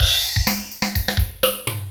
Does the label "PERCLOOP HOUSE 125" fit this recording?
Yes